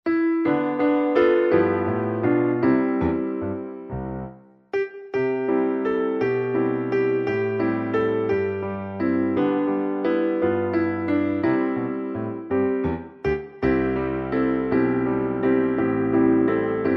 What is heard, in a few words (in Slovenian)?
VESELA POMLAD KLAVIR